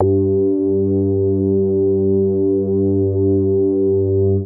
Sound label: G2, BASS; Q Up Arts; Dream Experience